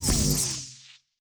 Shield Device Stop, Electric; Sci-Fi Sounds

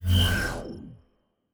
Teleport, Sci-Fi Sounds

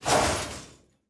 Metal Foley; Sci-Fi Sounds

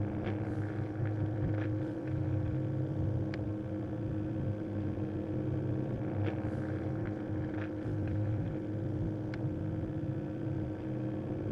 Hum Loop, Sci-Fi Sounds

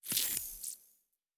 Device Stop, Electric

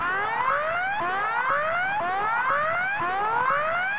Alarm Loop, Sci-Fi Sounds

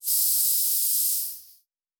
Air Hiss, Sci-Fi Sounds

